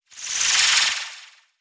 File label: Hip Sways; Evolve; Wraith